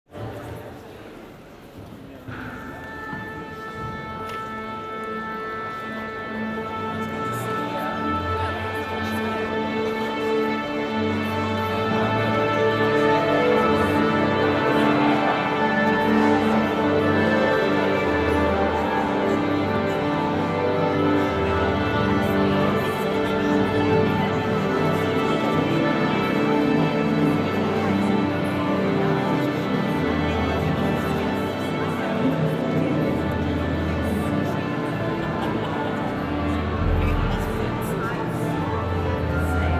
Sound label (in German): Konzertstimmungen Orchester Beim Stimmen